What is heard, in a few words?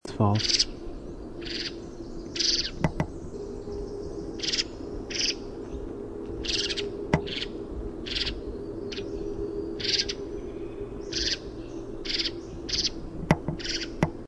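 Varied Thrush churrr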